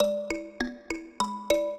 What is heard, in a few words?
minuet, mbira; Mozart